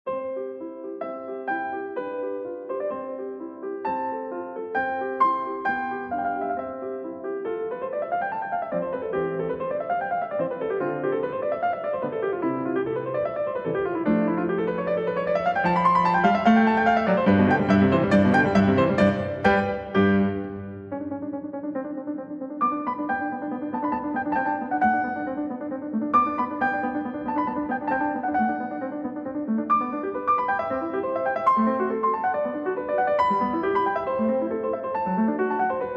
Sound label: Piano Sonata No. 16 in C Major, K. 545 Sonata facile I. Allegro